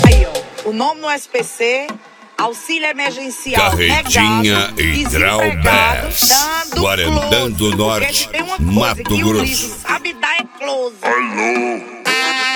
piseiro; hidraubass

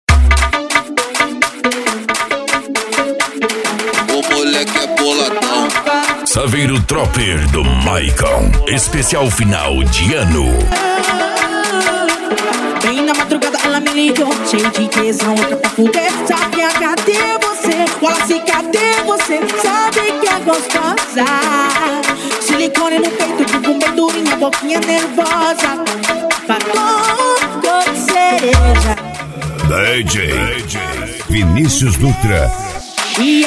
funk agressivo funk

